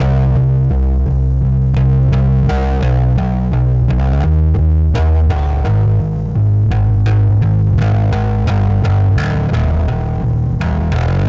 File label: bass